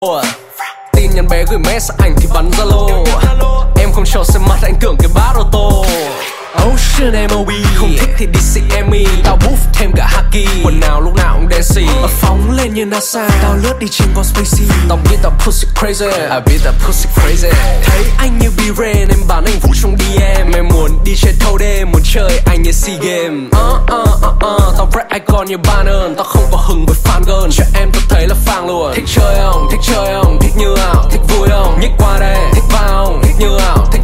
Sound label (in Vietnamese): nhac chuong rap